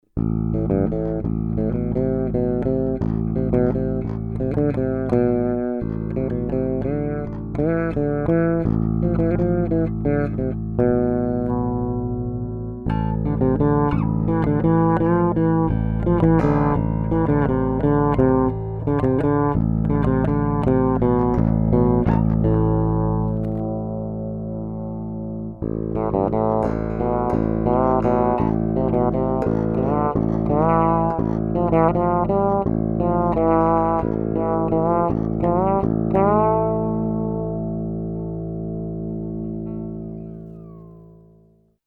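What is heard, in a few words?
round Wound EQ Mid Boost, bass; Ltd; Beide PU; nur Hals PU; nur Bridge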